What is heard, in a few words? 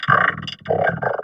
VOX VOX, Electro Vox